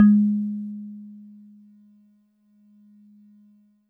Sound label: Warble Celeste DX100 G#-1